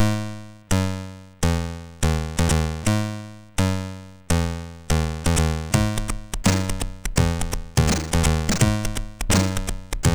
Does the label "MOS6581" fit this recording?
Yes